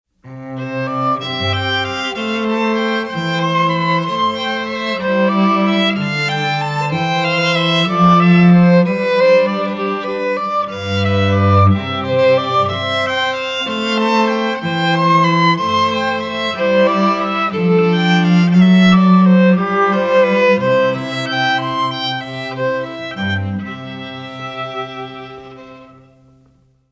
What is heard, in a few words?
String Trio; Jesu, Joy of Man’s Desiring from Cantata #147